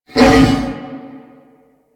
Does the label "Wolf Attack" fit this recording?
Yes